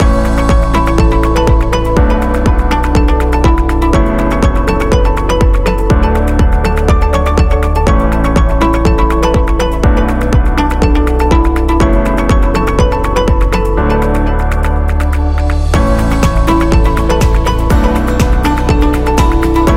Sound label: A Corporate Loop